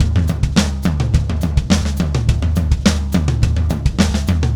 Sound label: Clinical Beat, Indie Rock; Sonic Foundry (Sony Creative Software); Indie Rock Anthems; Session 02 - Clinical